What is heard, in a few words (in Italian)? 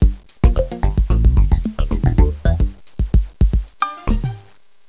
suonerie musica jazz